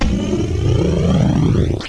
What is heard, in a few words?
Warp In; Star Trek; Rescue! 1.6